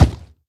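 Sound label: hoglin step, 疣猪兽：脚步声; Minecraft; 我的世界; 疣猪兽是一种生成在绯红森林的攻击型生物。它们是目前唯一可繁殖的攻击型生物。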